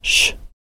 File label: short shush